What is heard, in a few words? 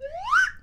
Quick Rise, Slide Whistle